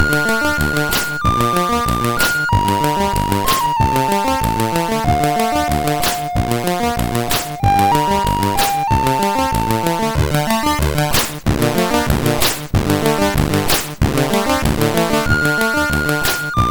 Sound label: Old Favourites, sid MOS6581R3